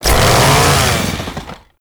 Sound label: Saw Attack Rev Heavy; Warframe; Kuva Ghoul Saw